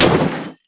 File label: CRASH